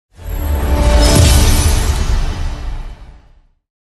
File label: game end; pubg